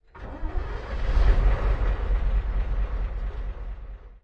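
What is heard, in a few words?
chieftain engine interior start, tanks; War Thunder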